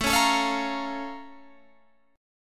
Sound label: A sharp Minor Major 7th